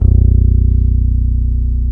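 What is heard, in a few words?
bass guitar, soul electric bass; Roland L-CD701; E.Bass v s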